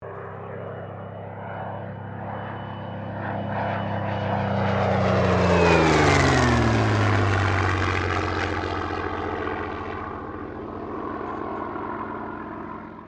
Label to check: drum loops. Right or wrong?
Wrong